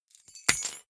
key ring large drop parquet hard; objekte; schluessel

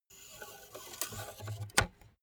car bmw e46 exterior oil rod insert

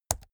keyboard laptop key press fast hard

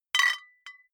flip-top bottles clink multiple; berufe; gastronomie

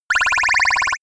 ringing small laser beam; krieg-waffen; soundpool

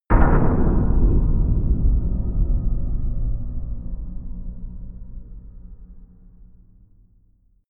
dark metal, metall; hits explosionen

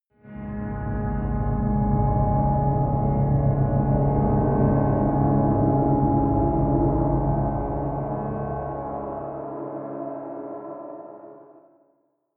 atmospheric drone pad deep; musikelemente; drones

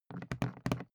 briefcase plastic handle handling quick; industrie wirtschaft; bueroarbeit

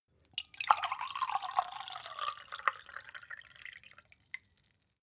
glass pour ring; soundpool

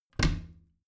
bathroom cabinet both close soft; objekte; tueren

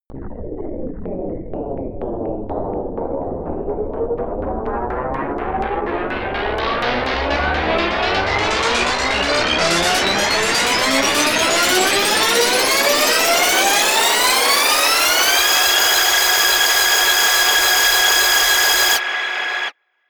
tape machine noise spin up, Risers; whooshes rises

